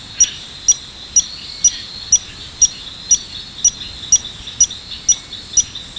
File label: Stumpffia achillei